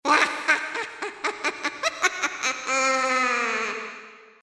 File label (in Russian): zvuki vedmy